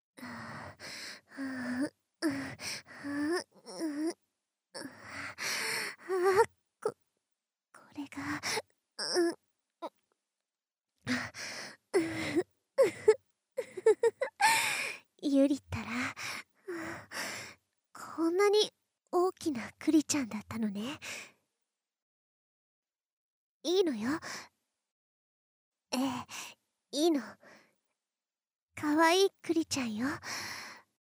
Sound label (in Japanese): フェラチオ